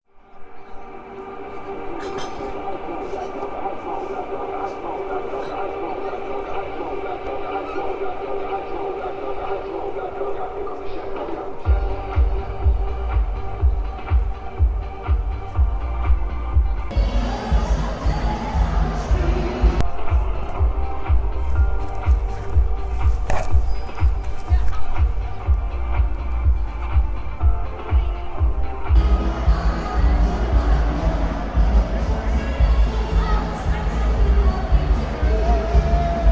O Ton Mix Oktoberfest; Wapelbeats

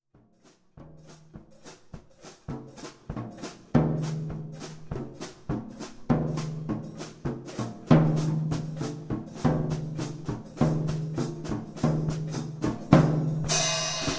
Solo Schlagzeug

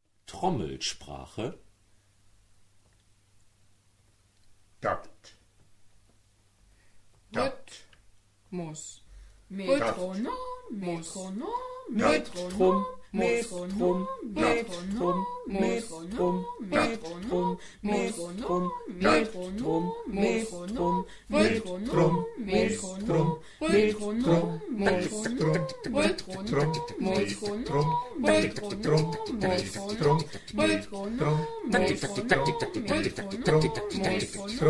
Trommelsprache

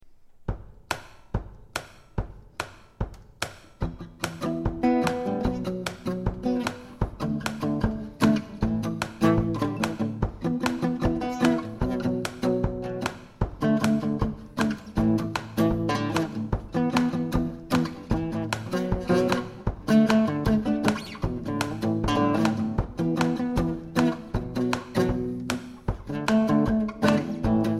Solo Rhythmus

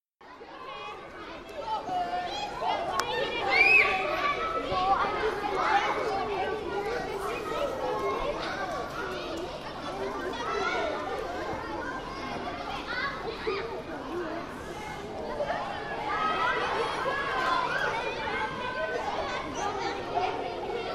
Schulhof; Altstadtschule

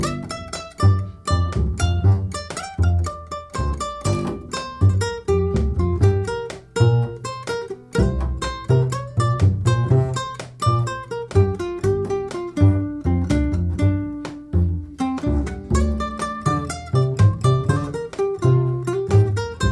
Trio Blues Gute Laune

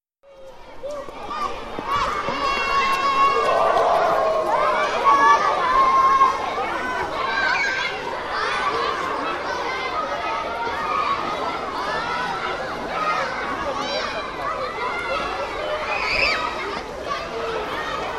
Schulhof; Altstadtschule

